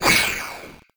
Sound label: wight attack; Crypt of the NecroDancer